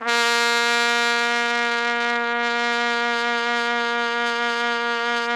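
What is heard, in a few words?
TPT, trumpet; Roland - LA Composer vol. 3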